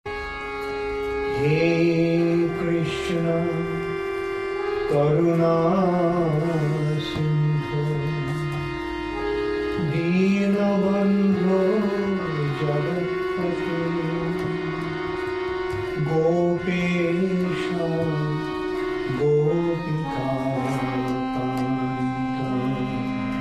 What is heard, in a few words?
Sri Krishna Pranama Sung; Pranam Mantras; HH Bhakti Caru Swami